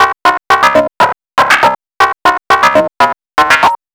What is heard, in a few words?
ARP SEQ 120; AMG - Vince Clarke Lucky Bastard